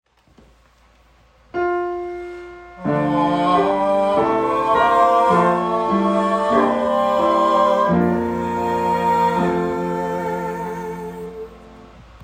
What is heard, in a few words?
Mass Amen